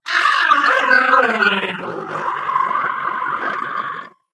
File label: ballbot fall from height